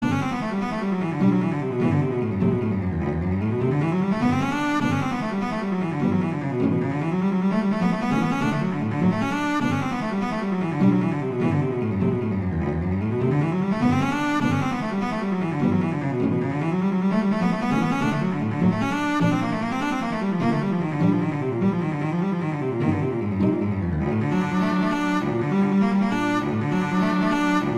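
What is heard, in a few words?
Etude, cello; Popper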